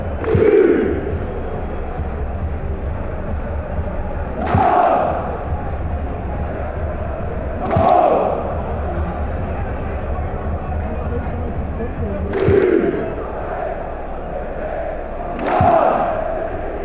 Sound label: chant, fan chants; dynamo